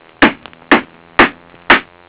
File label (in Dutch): hamer